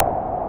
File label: Perc Hit, Noise